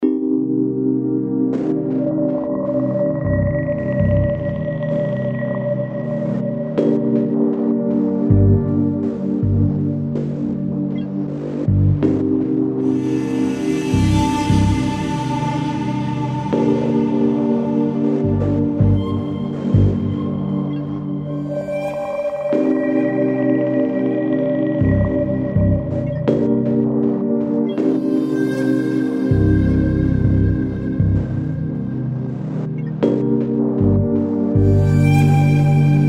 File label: submarine activity